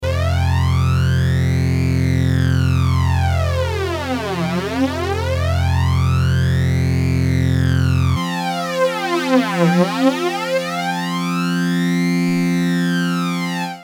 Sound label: prodigy ibanez fl99